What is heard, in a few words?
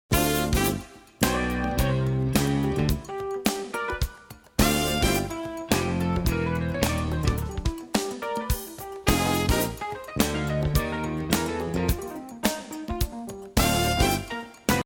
Upbeat Jazz